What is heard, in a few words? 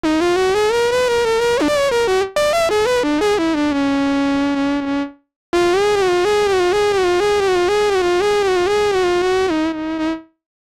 sax solo brass